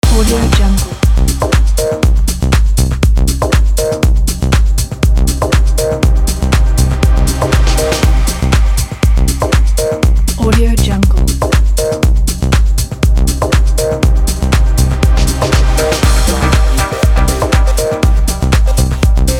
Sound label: techno loop; hotloops